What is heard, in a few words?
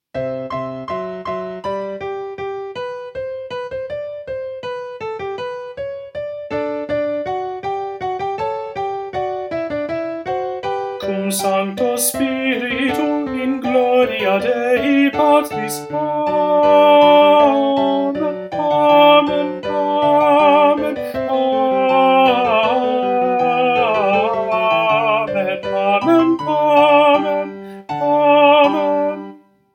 Tenor